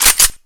Handgun Reload

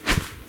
Swish

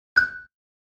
Cartoon Blink

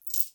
Collecting Ammo

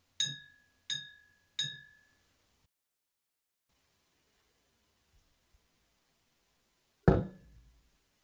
Glass Bottle

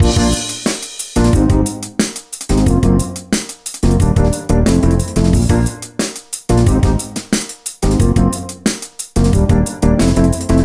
Loop

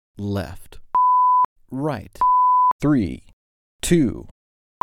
Tone Level Test 6 Db Max W 3 Sec Countdown Leader

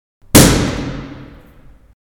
Gun Shoot

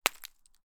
Impact Ice Small

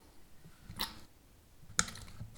Hand Wash Squirt

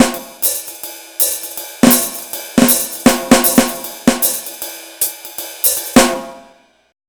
Jazz

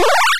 8 Bit Powerup

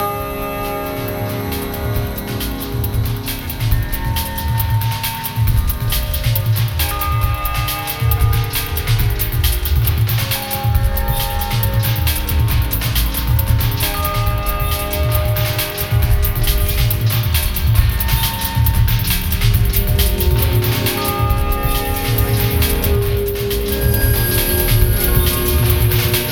Drums Background Piano Ambiance

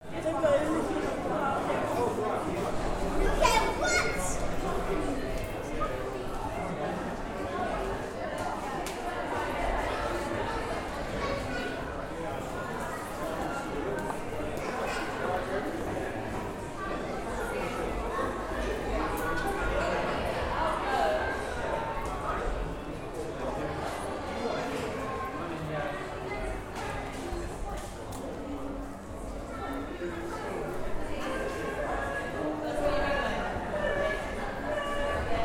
Busy Kids Chatting

